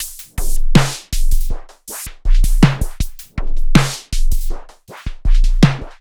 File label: Jazzy Set; Ударные и барабаны (Downbeat); Jazzy Set C; 80 BPM; Downtempo; Drums; даунбит